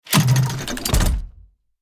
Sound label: Armored tank cannon loading